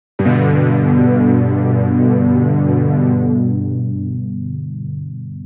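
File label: Brass Ensemble, jup8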